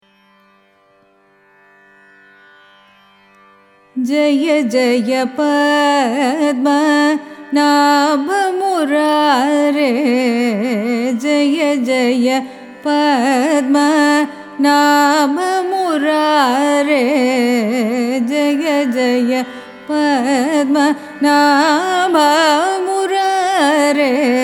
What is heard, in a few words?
raga sarasangi carnatic; carnatic music